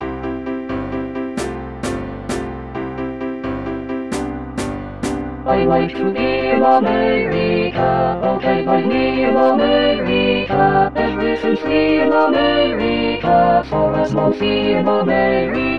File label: America Full